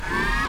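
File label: turret gun power up; allegiance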